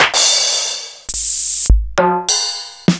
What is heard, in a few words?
Clavia Nord Modular Kit; Sliced